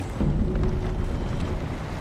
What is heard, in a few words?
ship sunk; sea of thieves